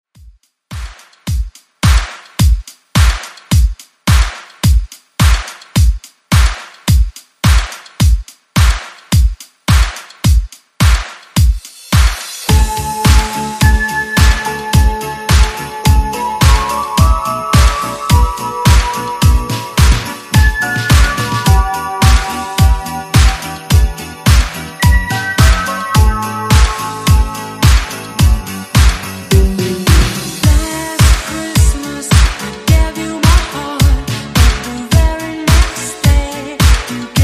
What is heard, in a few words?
Christmas 80s Redrum 107 Bpm